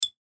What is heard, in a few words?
Poker Chip Single, Poker Chip Single Flip Hand Finger; Casinos; Sports; Casinos (Sound Effects); Blastwave